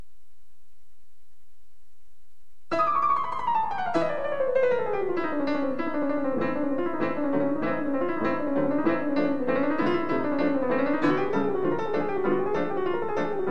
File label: bee; korsakov